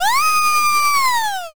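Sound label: scream female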